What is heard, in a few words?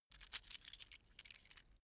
key ring mid size pick one; objekte; schluessel